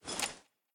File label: mvt armor; serqet; smite